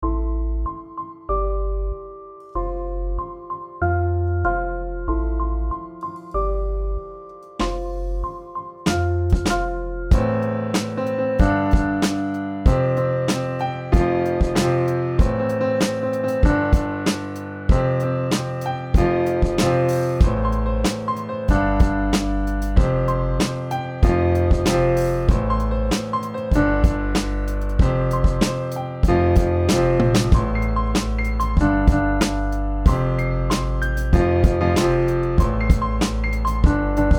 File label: Drum Kit